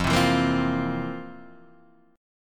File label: F Major 7th Suspended 4th Guitar Half Step Down